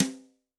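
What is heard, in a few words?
Brush Hit, Yamaha DTXpress-II snare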